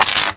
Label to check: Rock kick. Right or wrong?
Wrong